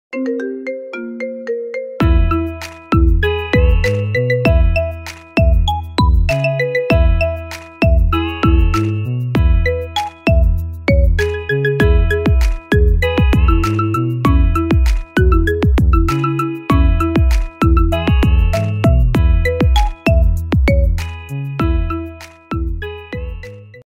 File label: Marimba